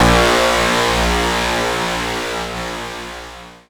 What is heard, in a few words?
PLUCKED, Synclav FM, A#; Big Fish Audio